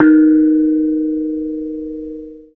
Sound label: KENONG; Spectrasonics; Heart of Asia